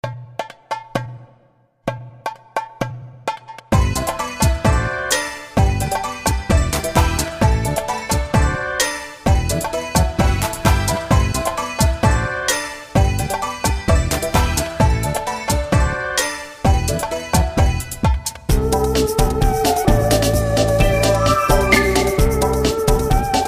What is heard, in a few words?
ethno